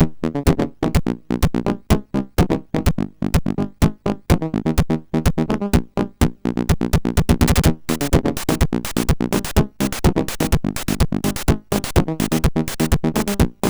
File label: Techno, MOS6581R4; Mortal Dogfight Techno